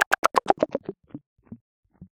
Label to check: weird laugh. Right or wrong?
Wrong